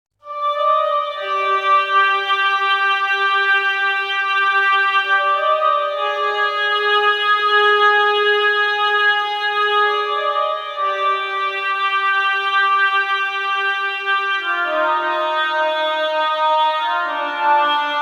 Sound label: variations oboe